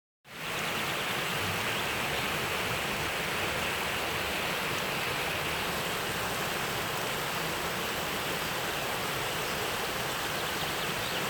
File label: Mountain Stream